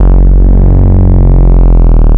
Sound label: BASS, 160 BPM, Drum & Bass; Ueberschall; Drum & Bass Flash Trackz